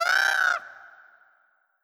Vulture

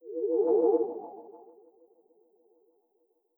Run Necro; Legend of Keepers (gamerip) (2019)

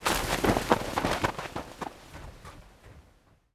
Runs Past; Fu Runs Past 01 V1

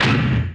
Ui Button; Knight Online

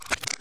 Lever Off

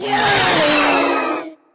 Mermaid Death VO

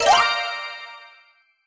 Gacha Result

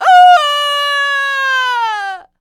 Enemy Generic Human Death Falling

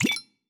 Potion Take; Folk Hero

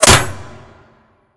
Hit; Legend of Keepers; Hit Sarco

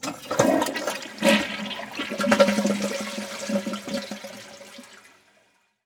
flush

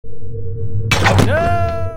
Catapult

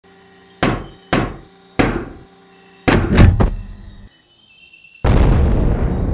Stomp; The Henry Stickmin Collection

